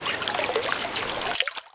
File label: land bass